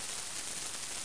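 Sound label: fireworks sparkler loop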